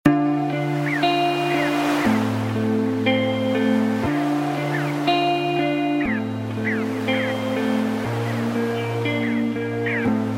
ocean

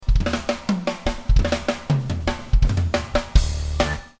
Drums

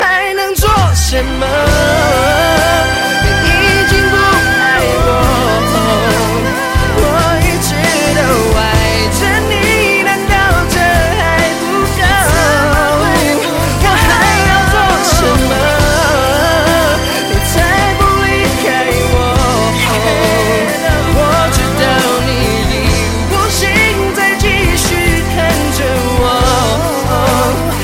Chinese Song

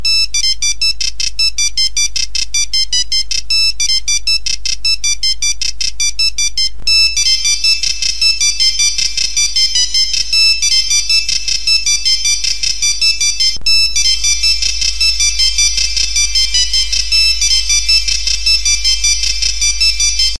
Wakeup Nokia

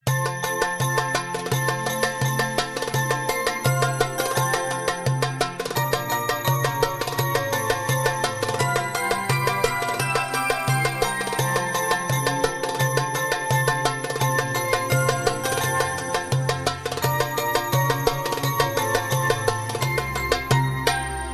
Jingle Bells Orient

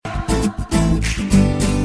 Guitar

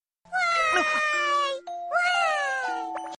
Yaaay; Chibi Italia

